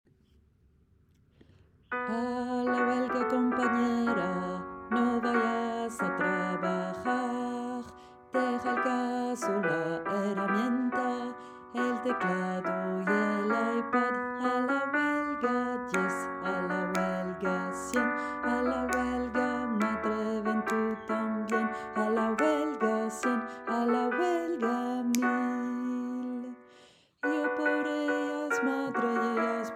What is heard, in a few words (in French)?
VOIX Alto, chorale; LA CHORALE ANARCHISTE